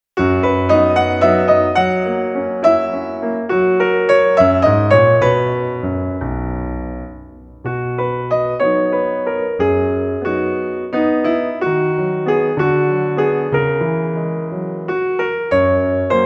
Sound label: solo piano